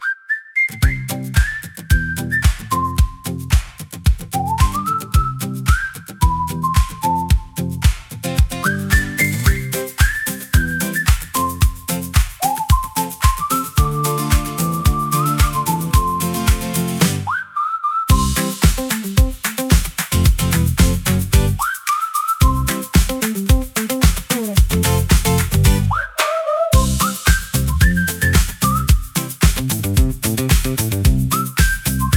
whistles, for cheerful active; morning

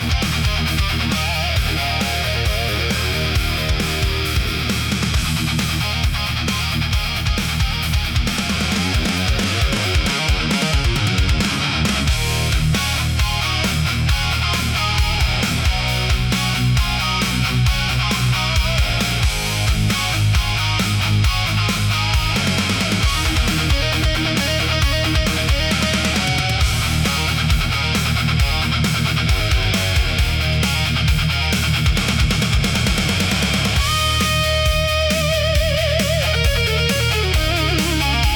hard rock for powerful

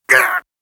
"Ugh", samurai voice; samurai voice selection 2

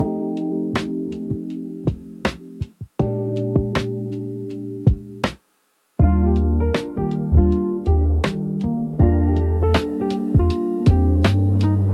emoi lofi piano for recall and nostalgia

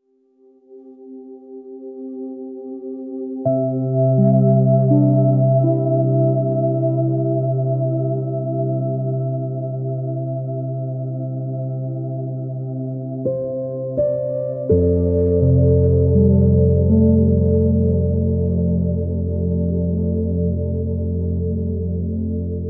Forest Ceiling, fantasy music nature forest; ghibli style; wind healing nostalgia

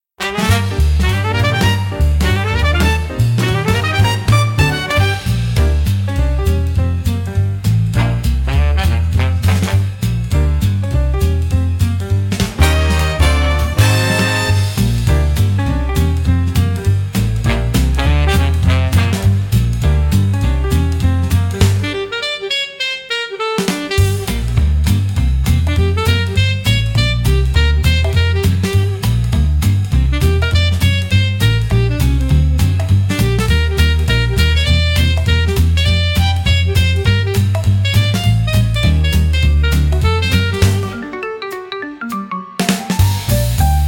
Brassline Boogie, big band swing

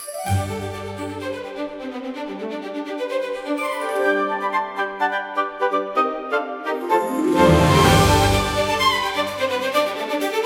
fantasy music cute bright; disney style; fun fantasy world

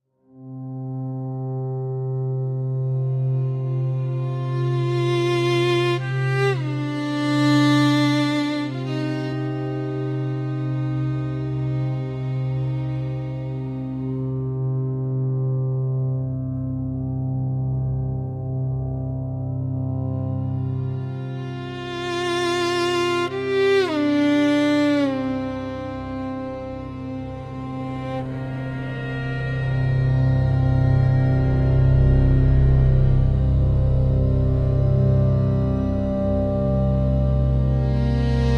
Glass House Drift, spiritual spooky soundscapes

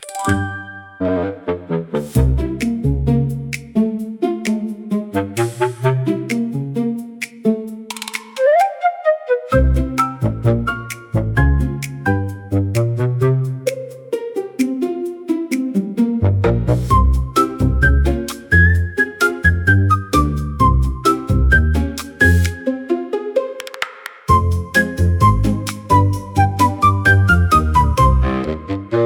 toy piano march for kids pets videos

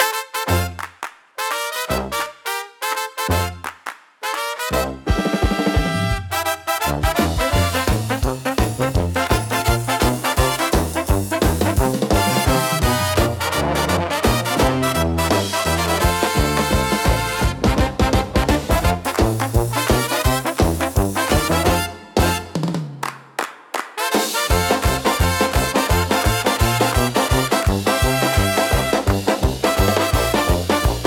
Midnight Brass Parade, upbeat carnival; for festivals and parades

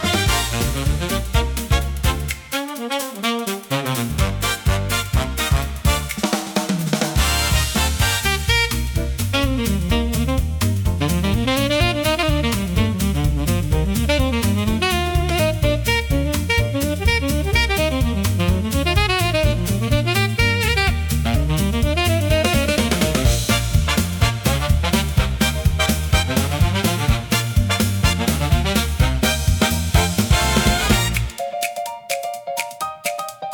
Late Night Horns, big band swing

